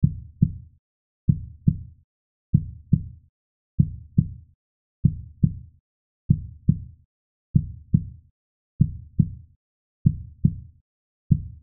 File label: Heart Beat Normal Speed Human Loop; Hospital; Emergency; Heartbeats